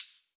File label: Ride, Cymbals; Vengeance Samples